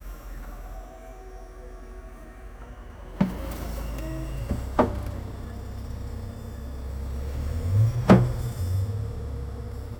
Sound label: the sound of a briefcase